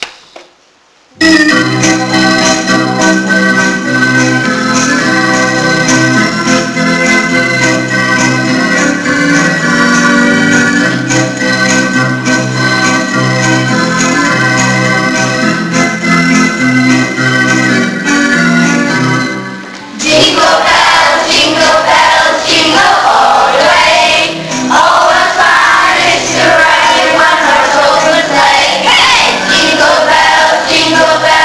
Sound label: jingle bells